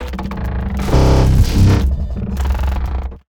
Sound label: body mech deploy sequence, Assault Mech Robotic War Unit; Bluezone